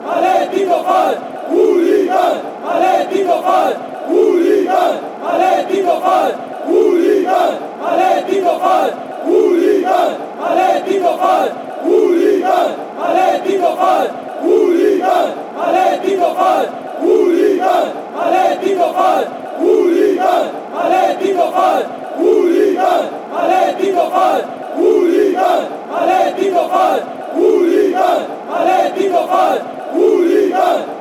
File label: hooligans, chants